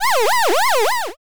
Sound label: confuse